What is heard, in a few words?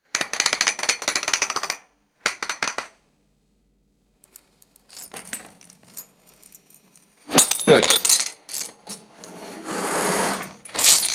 plastic tube rattling and spilling shards